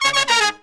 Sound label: 120BPM, Horns